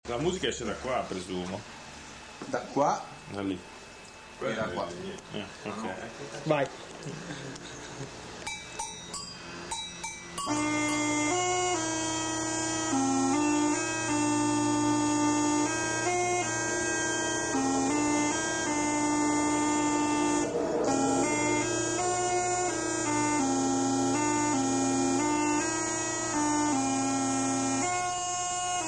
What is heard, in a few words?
Launeddas